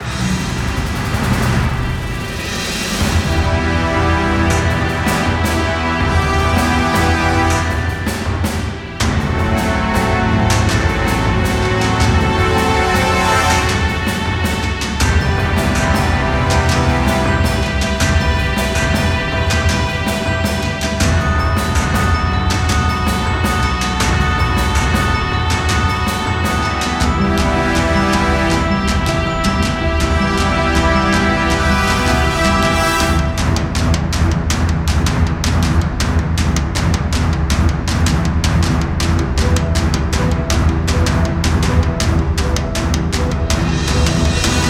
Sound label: story tense; nickelodeon all star brawl 2; ps4; xbox series xs